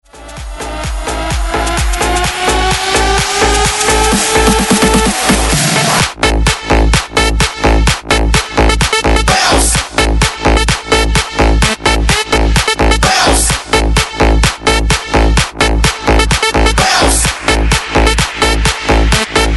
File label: 128 BREAKMIX